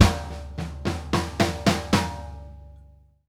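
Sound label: dynamic left, drum fills; Sampleheads - New York City Drumworks VOL-1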